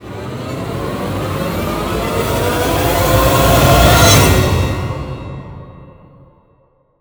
RISE

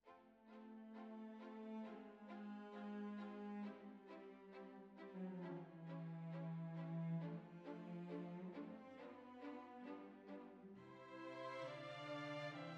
Flute

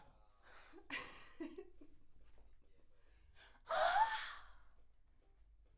Backs AKG LEFT

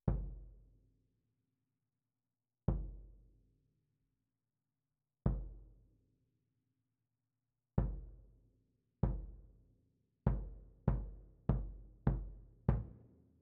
Snare Bot

